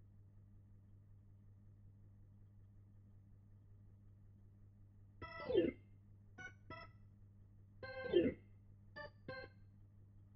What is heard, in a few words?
Hammond